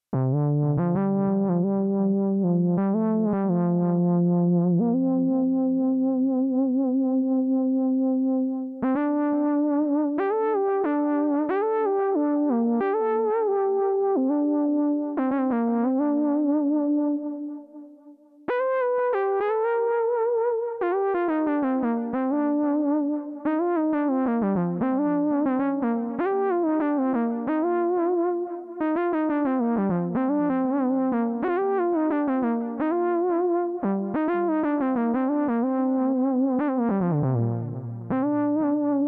Solo Lead

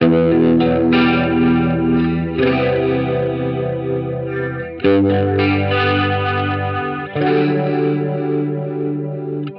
100 electric guitar loop soft metal Emin

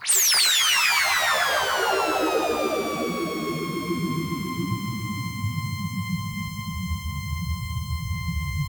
110 Fx

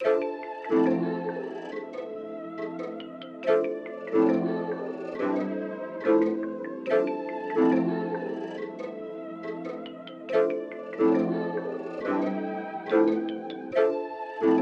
digital 140bpm

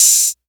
808 OHH

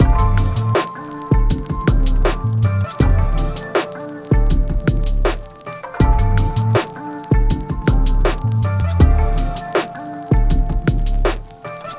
Beat 80bpm Dm Melodic Layered Soul

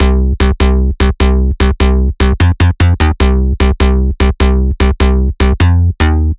150 C Bass Loop

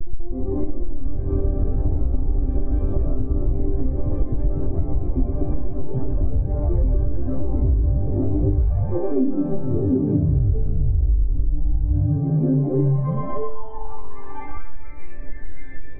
60 F Sub droplets

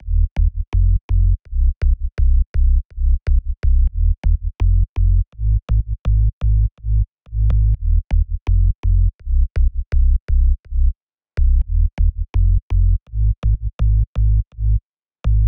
Sub Bass D 124 Wet

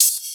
Open Hat

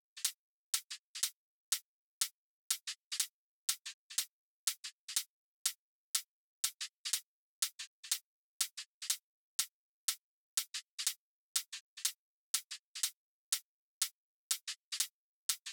HiHat Groove D

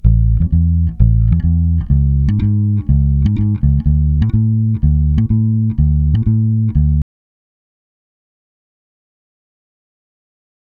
125, A, Tempo Bass

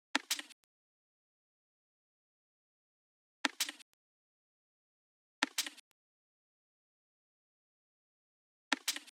Percussion 91 Fm

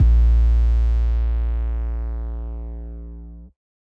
808 Distorted; Drillers

